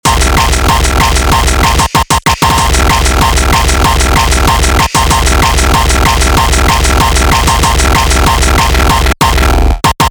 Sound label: New HardCore; drum; beat; Drum Blaster: The Ultimate Beat Generator, Sampler Plugin for Modern Producers